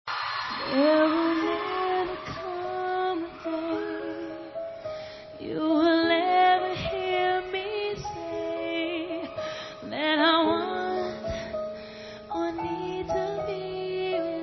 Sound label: unplugged version